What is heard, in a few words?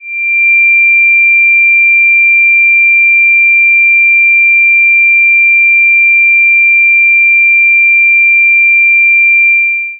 2370hz sine